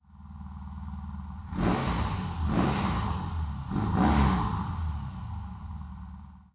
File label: R18 long dynamic; BMW